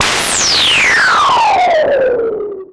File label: NOISE